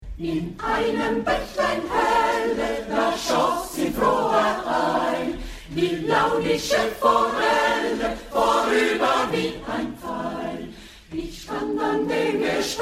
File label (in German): forelle Chor; forelle schubert